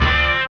Philly Hit, Loops & Phrases; Roland BK-5

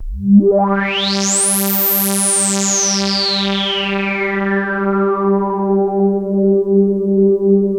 RESO G2, BASS; Best Service Dream Experience; R100M BASS

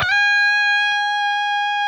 PRS A, Guitars, LEAD; Spectrasonics; Hans Zimmer Guitars VOL-1

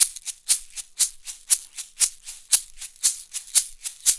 Roots Reggae; Sounds Good

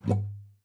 GUITAR FX; Xsample VOL-2

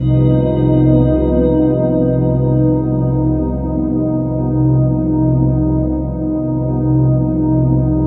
tension, ambient atmospheres

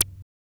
Moog HH; Moog Modular55

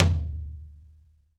TOM, Percussion; MARKYDRUMS

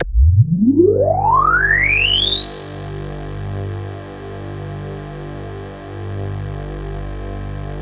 G, KORG DW8000, Vintage Keyboards; Big Fish Audio - First Call Vintage Keyboards

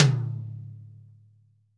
TOM L, GM DRUM SET; AKAI S-Series CD-ROM Sound Library VOL-8

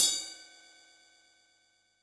BELL, Yamaha DD-65 CYMB

